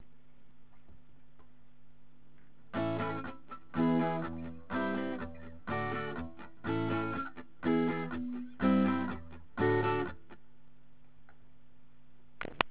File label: fret hand muting